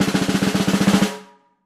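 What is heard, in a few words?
Drums Snare Med Crescendo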